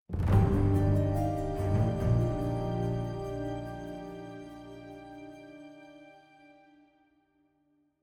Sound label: mus ui attention urgent intro; opal